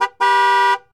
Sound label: Truck Ford250 Horn; Trucks